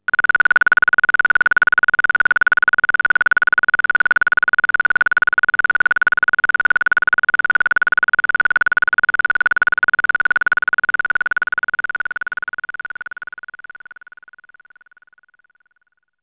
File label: tuning